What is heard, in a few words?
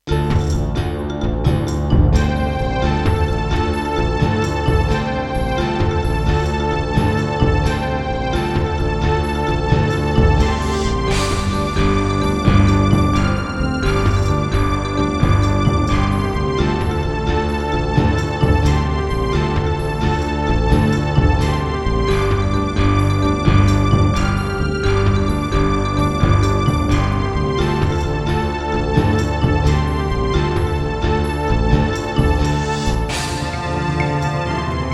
Turtle Beach Rio, wavetable; Heretic